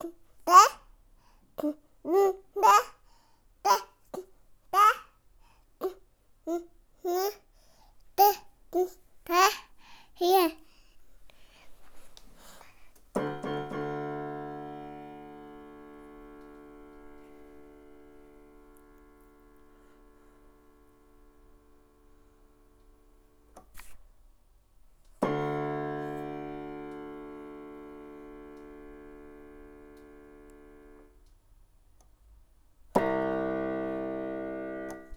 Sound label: piano play child